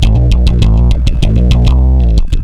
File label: WHA DISTO B; Big Beat Samples & More